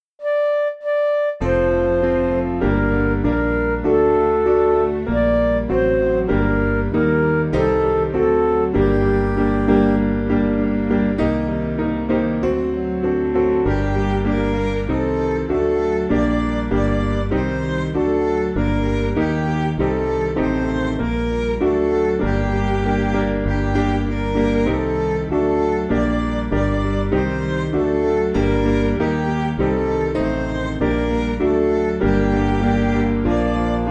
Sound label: Music Backing Files for Churches